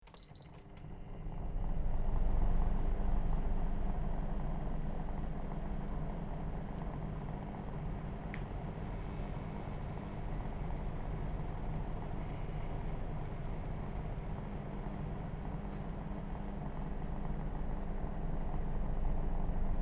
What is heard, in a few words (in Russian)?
ventiliator